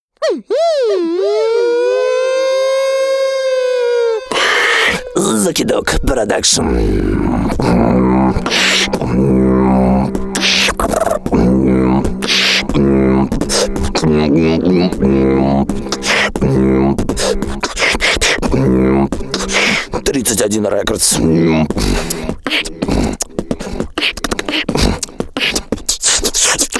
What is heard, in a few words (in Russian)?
beatbox